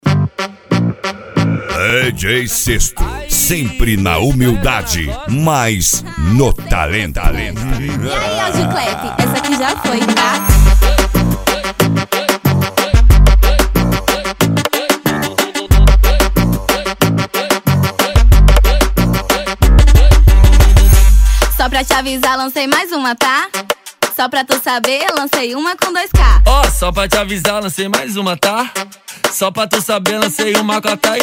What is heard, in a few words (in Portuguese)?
som automotivo